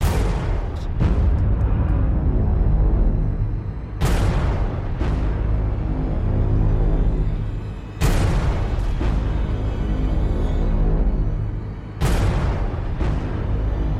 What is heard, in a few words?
thrilling trailer riser; soundscrate